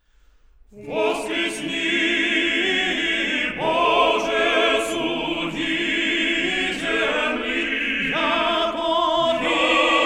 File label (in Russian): Хор Валаамского монастыря